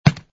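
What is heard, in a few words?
footstep stone; REM-Freelancer; REM-Mod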